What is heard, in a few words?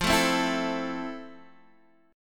F Major 7th F, Guitar, Standard; strummed; JGuitar